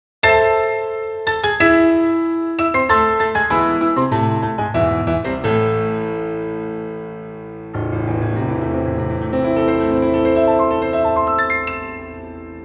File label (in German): Klavier Konzer; Grieg Klavier Konzer